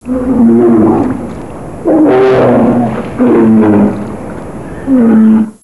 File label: polar bear sounds